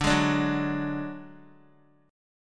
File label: D Major Flat 5th D Guitar, chord